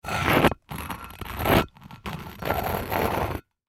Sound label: Stone Drag Object Statue On Cement; Dragging Sound Effects; Foley; Blastwave